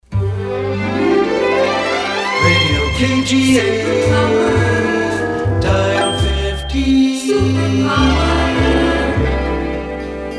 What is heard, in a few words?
String Power; KGA; PAMS